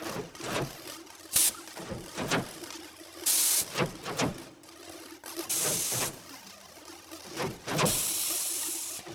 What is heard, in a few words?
construction progress, ui; Stellaris